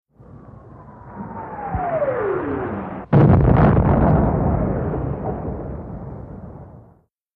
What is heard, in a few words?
Incom Shell Whir Impt, modern artillery exterior